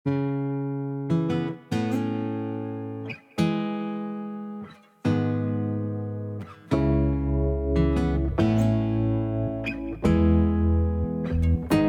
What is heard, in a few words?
dozhd acoustic version